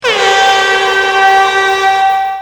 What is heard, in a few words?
Toro Horn; Horns